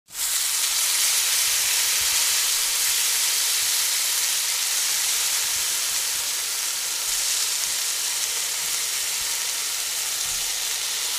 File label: sizzling frying pan